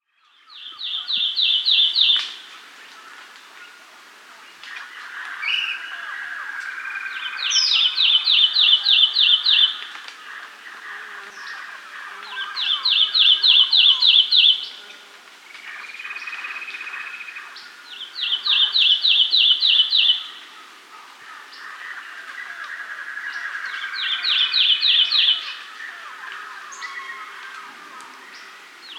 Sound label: Lemon-chested Greenlet (Hylophilus thoracicus), Peru, Birds of the Amazon; S. America